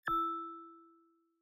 Battery Magsafe Haptic; i OS; iOS10; nano

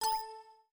Windows Ding

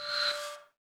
Speech Disambiguation; Windows; Glass

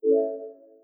siri begin improved; homepod OS

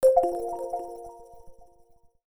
Windows Alarm Notification

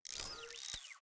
Windows Restore

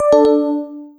powerlow; Linux

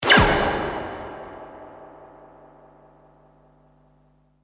Zing; US Open; Millionaire Soundtrack; US Soundtrack (1999 - 2008)

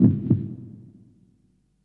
Heart Beat; Millionaire Soundtrack

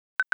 Sedentary Timer Salient Haptic; i OS; iOS10; nano

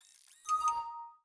Windows Notify

